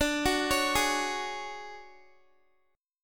D Major 7th Flat 5th D down Guitar 4F 4A 5C 5G 5C 6E; DM7b5 arpeggiated